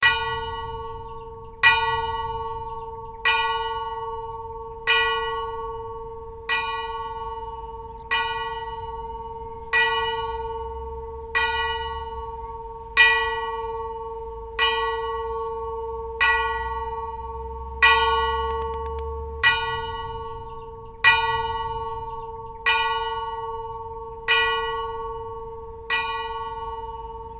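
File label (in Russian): хрустящий церковный колокол звонит в колокол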